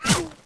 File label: whizz